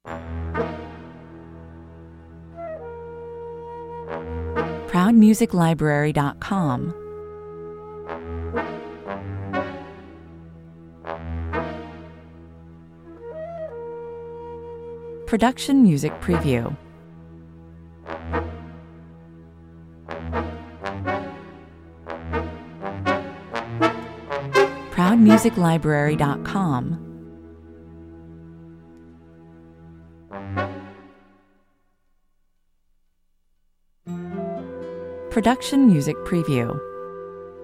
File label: Dark, nervous and suspenseful underscore with threatening staccato low brass chords contrasting with wo; woodwinds and pizzicato strings that add a lighter touch